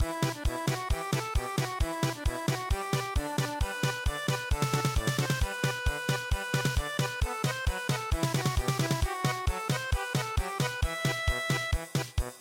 German Polka; VOLGA River

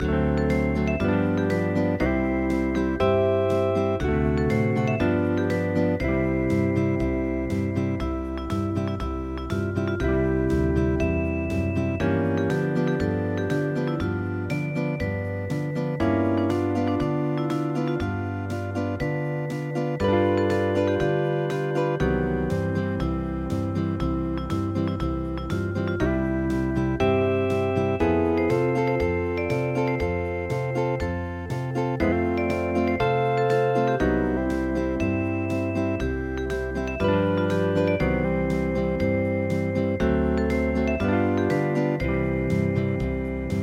hawaiian

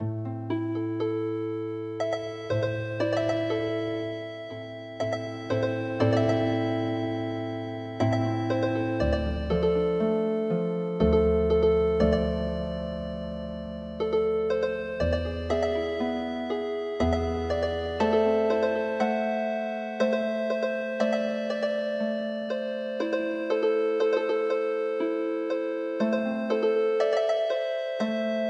Healing Harp

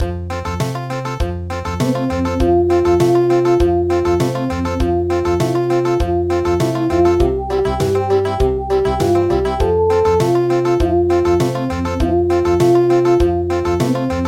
Country, midi

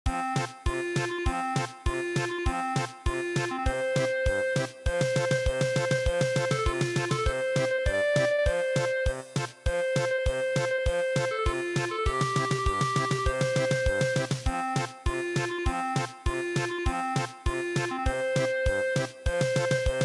German Polka

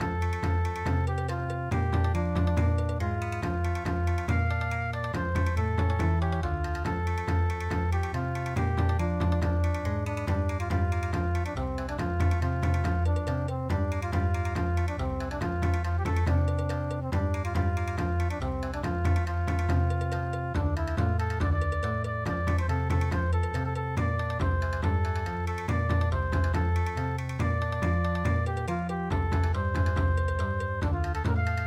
Bon Odori

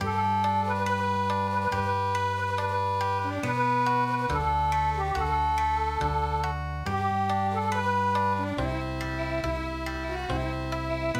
Okinawan Lullaby Okinawa, midi

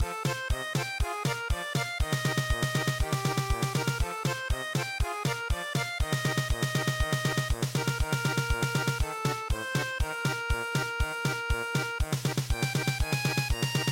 German Polka, traditional; E Mama E

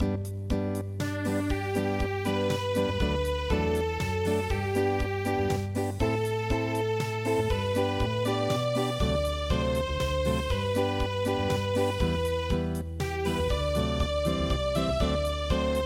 Acoustic, traditional; Durchs Wiesetal Gang I Jetz Na

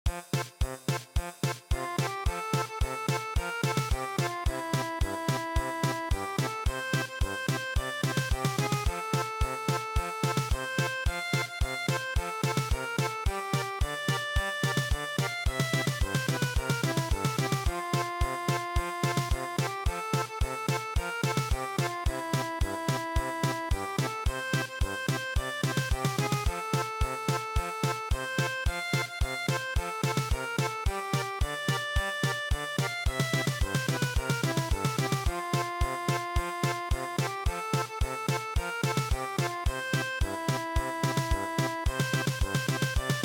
German Polka, midi

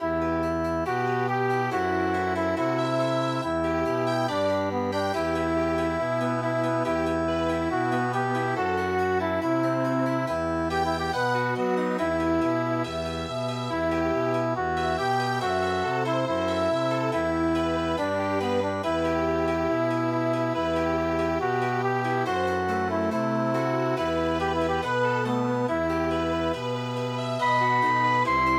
Chamber Music

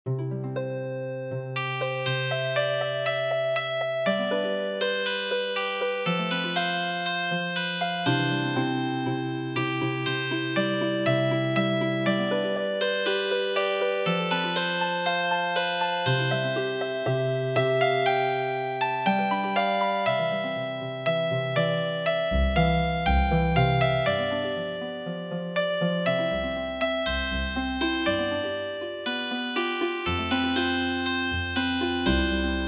Healing Harp, traditional

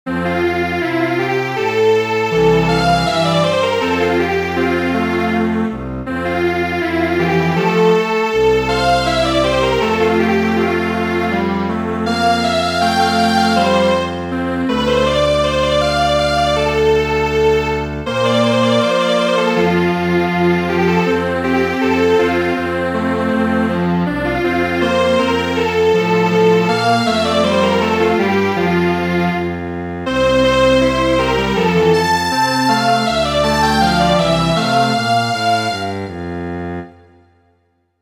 Strings Quartet, midi traditional

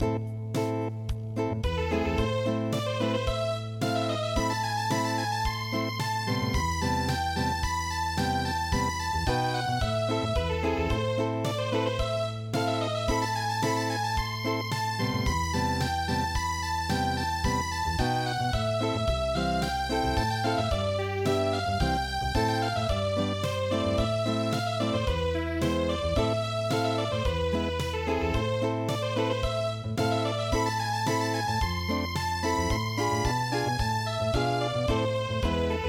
Acoustic; Rondo Alla Turca

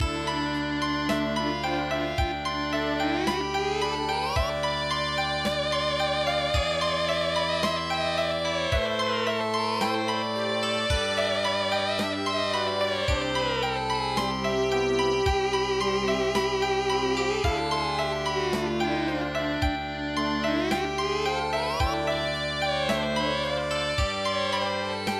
Erhu Music

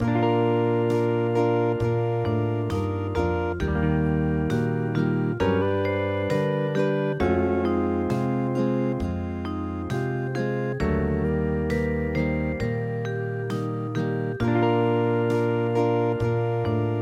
Londonderry Air hawaian

